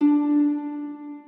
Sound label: harp